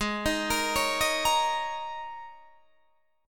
G sharp Suspended 2nd Suspended 4th G sharp Guitar DADGAD; 18,18,20,18,18,20